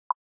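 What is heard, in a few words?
mac os operating system sounds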